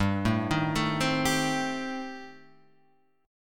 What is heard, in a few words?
A-Minor 7th Flat 5th G, chord